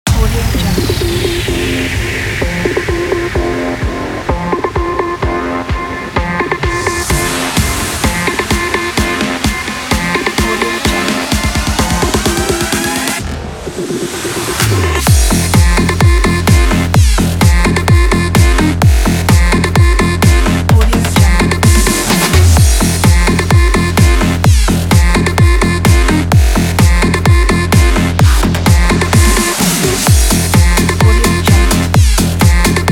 electro dubsteps

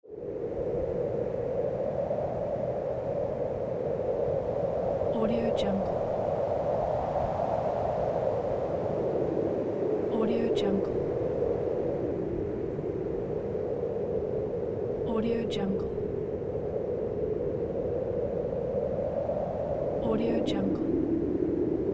wind; danielmix

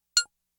blip tone single high pitch synth blip staccato; prosoundfx

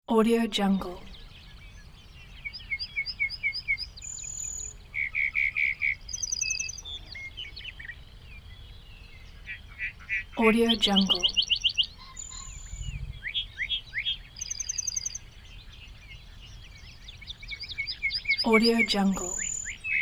birds ambience; alexleadsounds

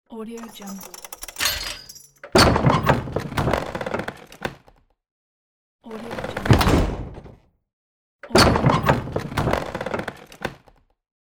wooden door

